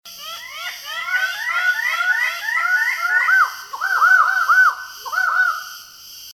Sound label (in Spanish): sonidos cantos ave del paraiso raggiana raggi